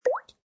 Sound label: water drips single